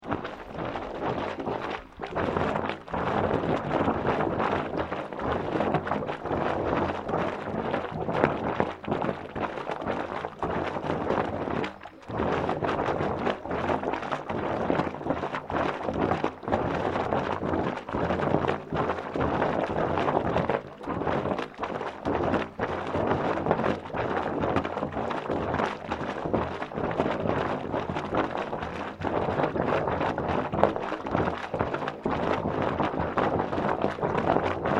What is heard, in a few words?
PUMPING STATION HOT SPRING STEAM OUTLET FLU, Japanese hot spring pumping system; Machinery; Machines